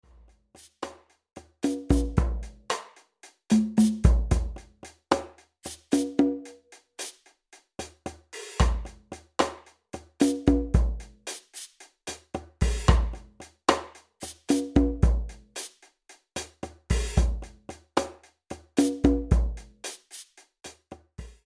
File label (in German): puls drum